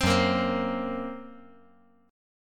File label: F sharp Major Flat 5th; F#Mb5 strummed